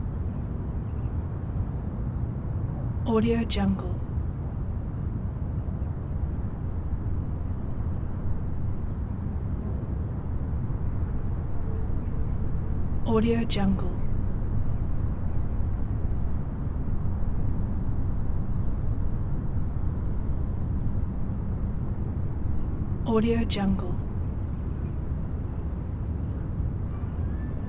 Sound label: distant city traffic; prosoundfx